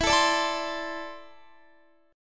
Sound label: E 7th Suspended 4th Sharp 5th E down Guitar 4E 4A 5D 5G 5C 6F; strummed